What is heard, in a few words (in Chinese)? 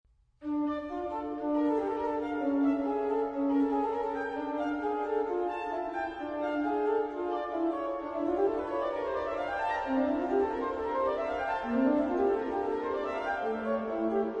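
鋼琴; HORTUS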